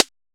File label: Rimshot, Dubstep One-Shot Drums; Rimshots & Toms